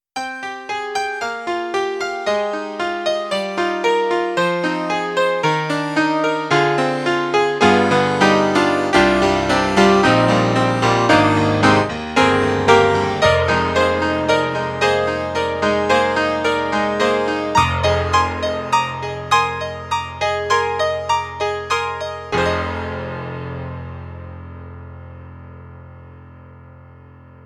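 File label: SoundEngine Wave Blaster Electric Grand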